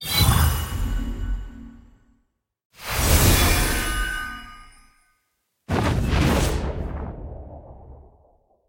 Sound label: Ui Card; Archeland